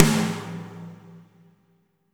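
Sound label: reverb snare left; Spectrasonics; Burning Grooves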